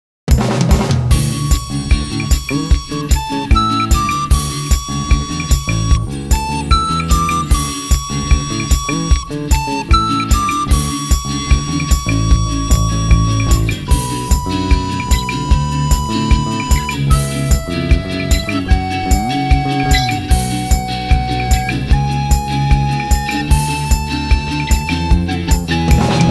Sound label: Dose of Innocence Soprano Recorder ver.; No More Heroes 2